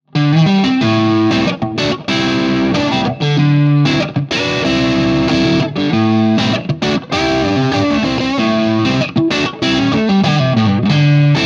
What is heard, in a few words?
Blues Rhythm